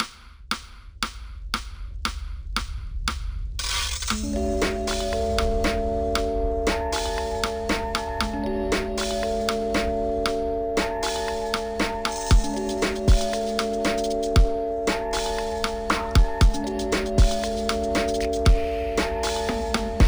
Computer Menu; friday night funkin vs. bobs reslaught

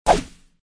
Sfx Enemy Lancer Attack; Mighty Knight 2

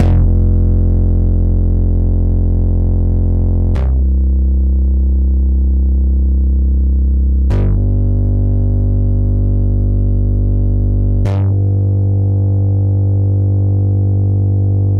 Poly Bass

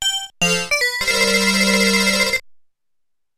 Arcade Round Start Music; Pac-Land

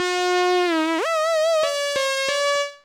Beat

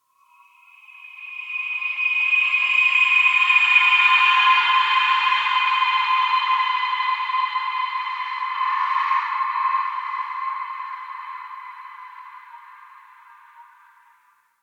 Combat Horror

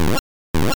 Clamp; Pokémon SFX Gen 2 Attack Moves; GSC

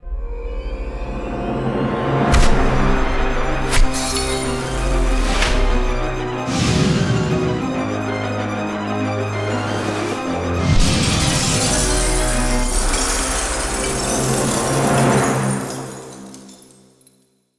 suspect fires arrow; Sea of Lies: Tide of Treachery Collector's Edition

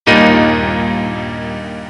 Exit Chime

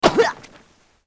Yellow Hit

